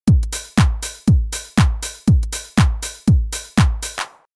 Rhythm House

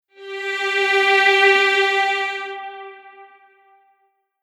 Strings Layer Blend

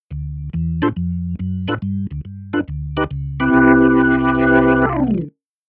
Keyboard Organ Vib Cho

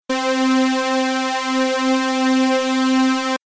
Mix Pan Imager R

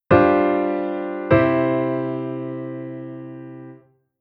Chord V7sus4-I

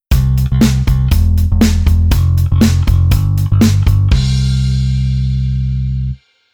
Bass Arr Rhythm Kick Hokan

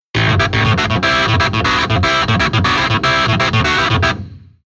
keyboard clavi amp

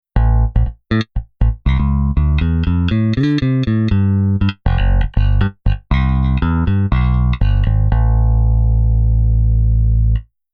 Bass Pre

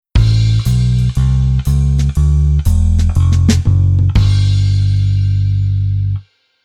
Bass Move

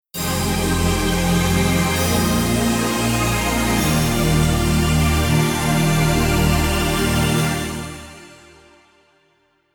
Chorus Flanger Case 2 After

